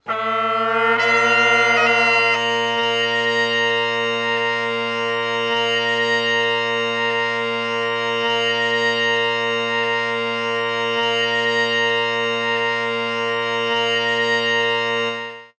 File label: bagpipe